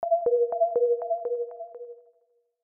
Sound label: Alarming Sonar